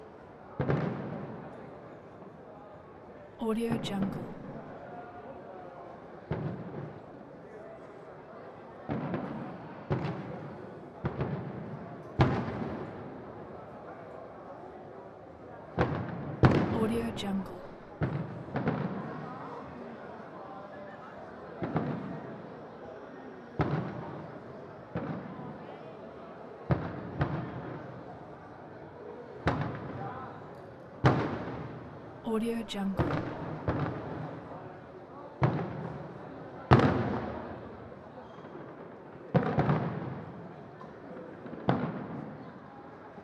city centre distant fireworks

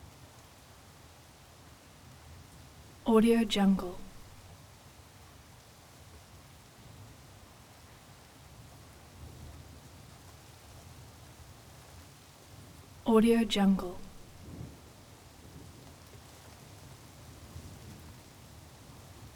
ambience city rain thunder

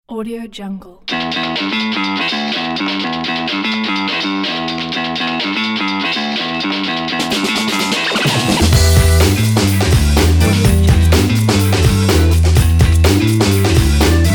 funk fraudster and conman; quiqstudio

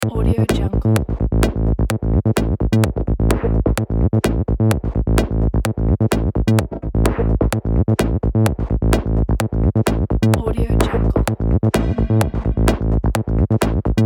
techno loop

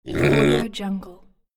piglet grunt

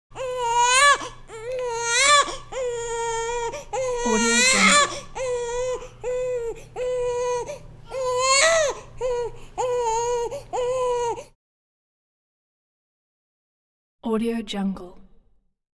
baby cries in hospital nursery; hollywoodedge

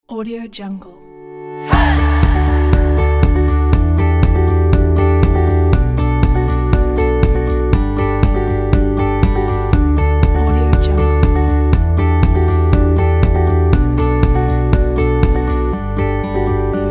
for travel vlog

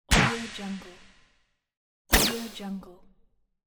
arrow magic shooting

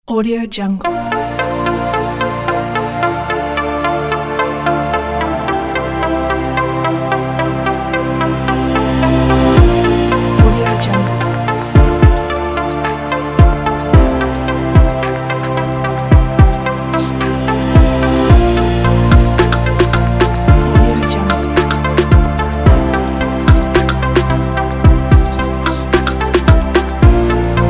hitech technology

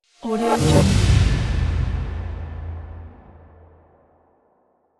double incoming deep impact

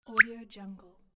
hud pop

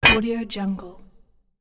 armor hit

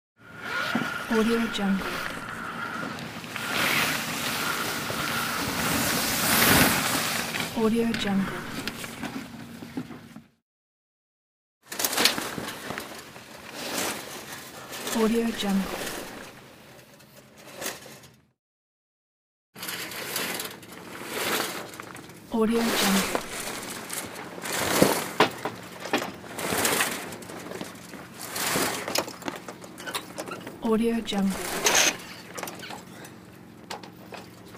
sail raise and lower; palmirasoundstudio

